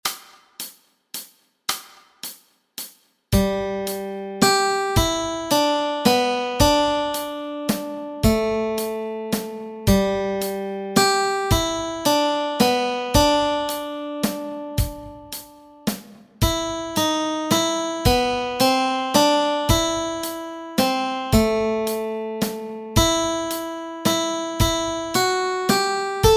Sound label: melody